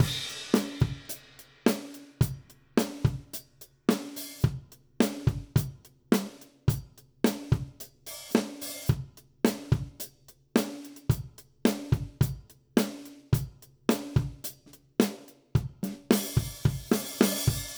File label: Country Drums Chorus 2; Digidesign; Pro Tools; Pro Tools Essential Demos; Essential Loop Demos; Demo Session - Country